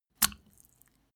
step barefoot wet

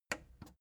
plastic button small simple click slow

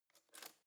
cutlery spoon drawer grab soft

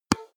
tennis ball hit

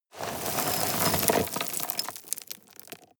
sweep small rocks

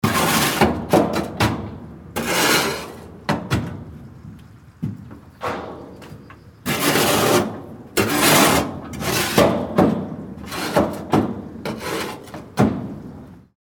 clean a cattle trough

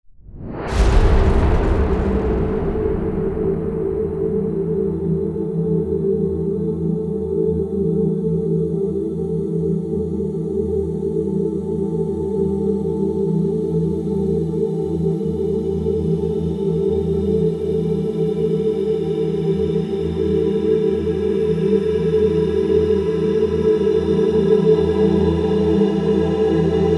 evolve pad

